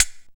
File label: HH HH, DANCE; DanceMix